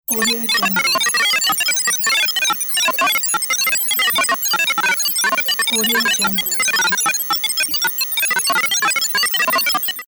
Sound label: high tech scrolling texture